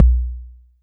BD; TRX

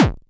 BD; TRX

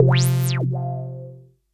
SYNTH

